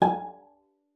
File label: cello pizz G#5; 90 s Sample CDs; Xsample VOL-5